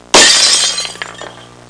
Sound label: breaking glass; Household